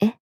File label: tap